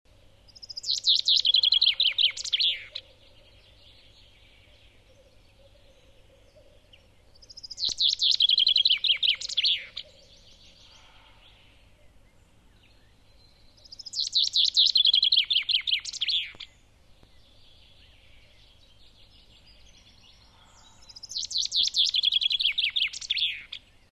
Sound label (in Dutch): Vink fringilla coelebs